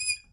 squeak; civ13; civ14

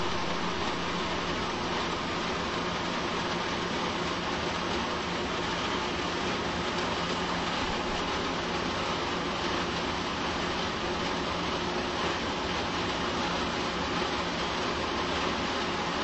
gas pump

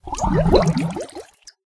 bubbles; civ13; civ14; Effects Chemistry

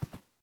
tile, Footsteps

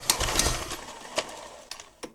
generator tug empty; Machines

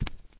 wood barefoot, Footsteps; civ13; civ14

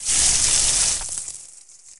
blood splat

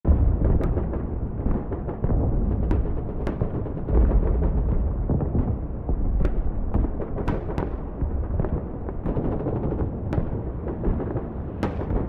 ww2 battle distant; civ13; civ14; Ambience

